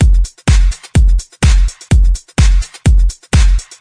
big ol kick snap, drum loops and breaks

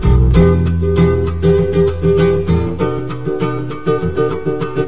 enthusiastic mellow guitar; GUITAR LOOPS

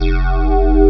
narrowdeep, synth

